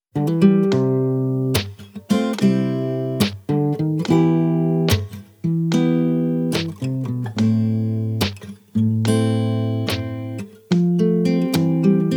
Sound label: Instrumental Mix